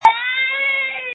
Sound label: Scream